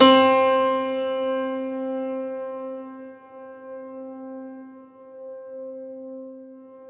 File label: Bosendorfer; Optical Media International - Sonic Images Library